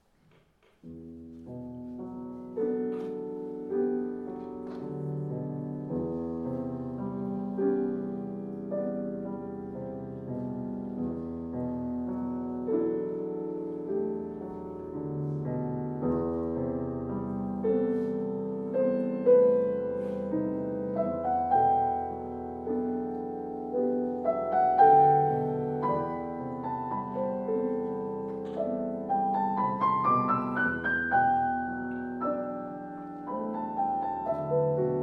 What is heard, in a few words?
Tango